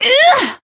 attack

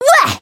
Voice attack; nekoko

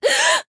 damaged

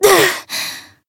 Voice damaged; toova

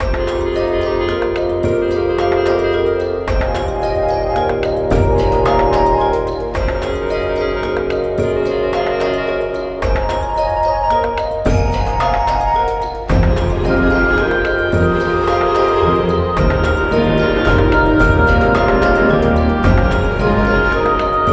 Bgm dungeon underwater cave

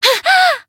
Voice damaged; rosetta

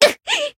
damaged, jp

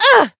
attack

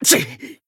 damaged

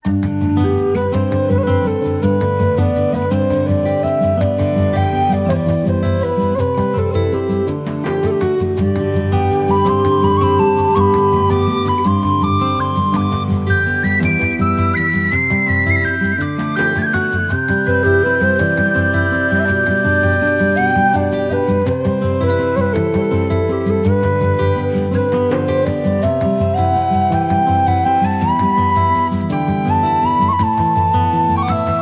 Bgm town coal mine

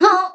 damaged

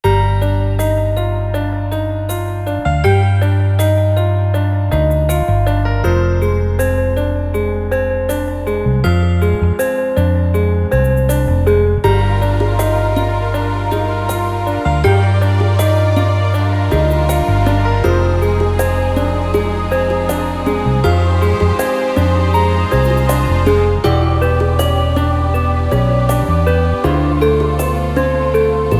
Bgm dungeon

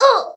damaged

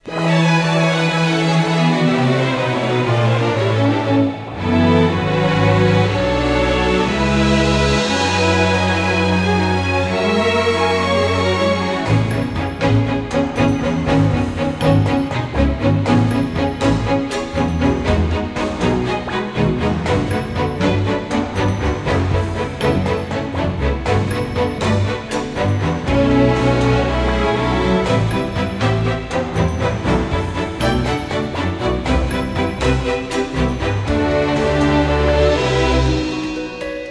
Bgm dungeon steam sea

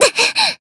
Voice damaged, jp; isuka

